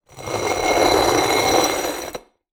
Glass Drag On Floor, Medium Wine Glass

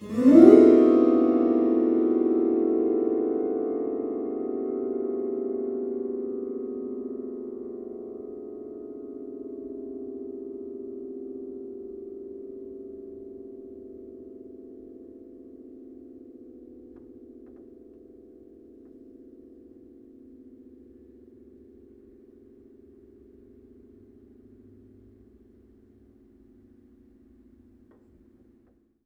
String Brush, piano; Piano Miscellaneous

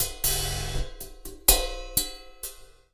Cymbal; Percussion Samples

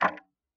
Tick, Clocks Kitchen Clock

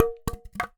Polystyrene Rattle

